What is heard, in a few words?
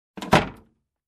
Old Wooden door close